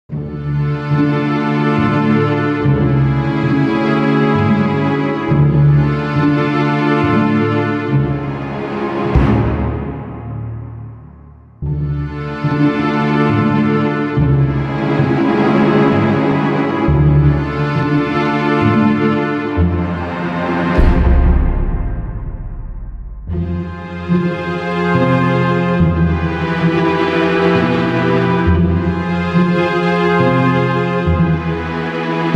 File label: music suspense; dragon fin soup